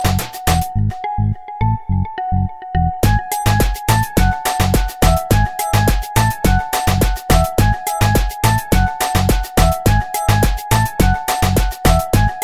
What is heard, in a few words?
Beats